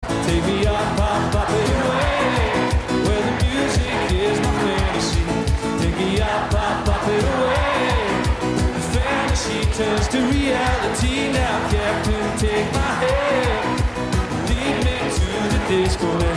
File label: Disco